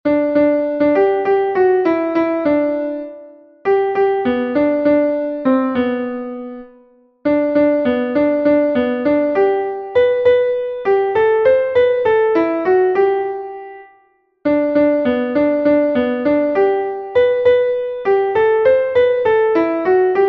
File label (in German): klavier; MelodieMeister